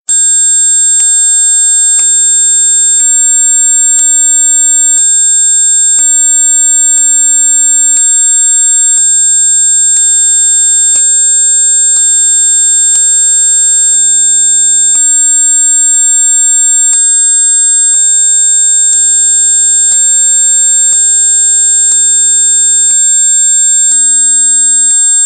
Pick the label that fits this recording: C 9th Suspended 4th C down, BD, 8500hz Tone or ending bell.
8500hz Tone